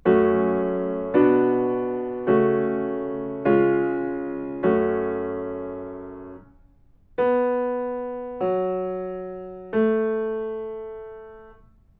melodic fragments; ear training

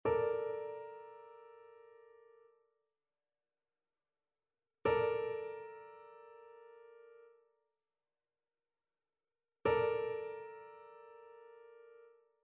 trichord; trichord identification

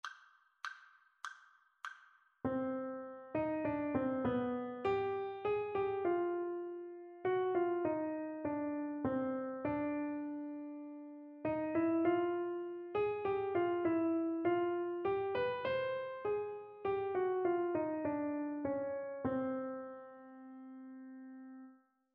melodic dictation; eartraining

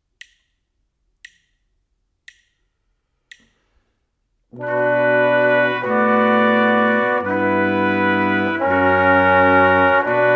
harmonic dictation

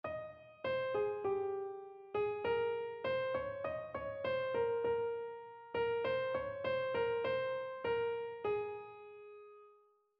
melodic error detection

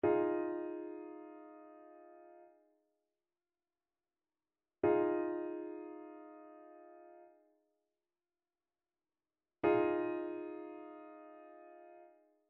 trichord; trichord identification

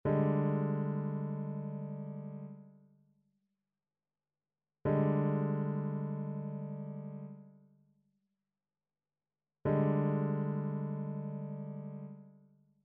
trichord; trichord identification

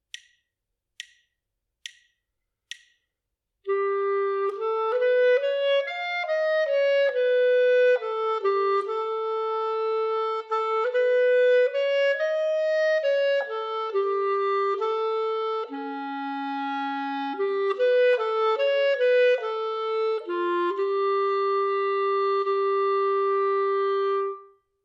melodic dictation; ear training

